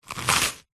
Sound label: human eat bite single into crispy traditional french baguette; zapsplat